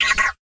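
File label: rocket detonation, weapons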